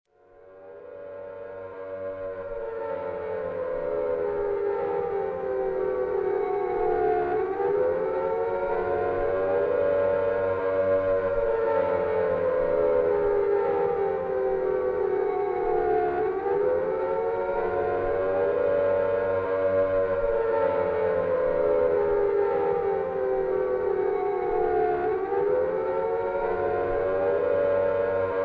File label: Sirens